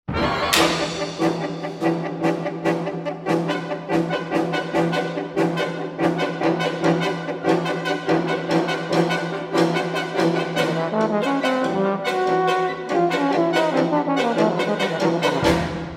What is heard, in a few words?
Concerto for Euphonium and Wind Ensemble - Primitive, driving